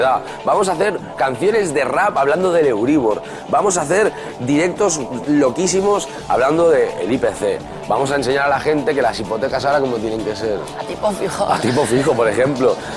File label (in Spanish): rap hablando del euribor